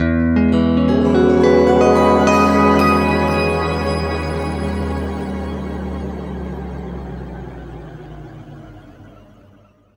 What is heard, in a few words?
E, guitar chords; East West; Steve Stevens Guitar Samples Collection